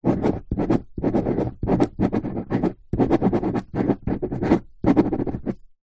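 office pen ball point writing paper on plastic table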